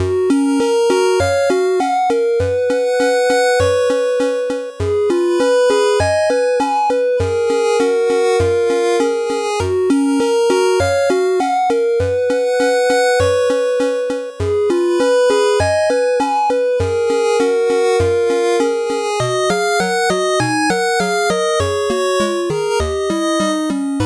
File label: 8bit bgm normal